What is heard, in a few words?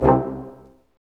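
BRS, Brass Ensemble stac; Roland L-CD702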